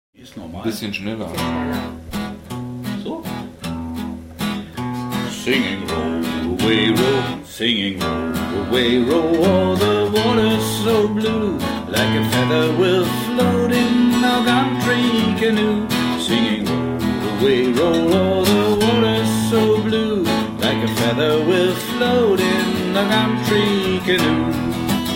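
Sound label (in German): refrain zum Üben für Frauenstimmen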